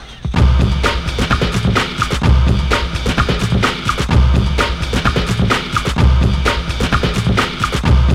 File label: break